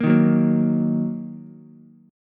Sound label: A sharp Suspended 4th Sharp 5th A sharp down Ukulele; strummed; JGuitar